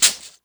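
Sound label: FX shaker; AKAI S-Series CD-ROM Sound Library VOL-5; FX PERC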